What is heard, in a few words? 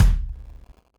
DRUM; the idolmster one for all; ps3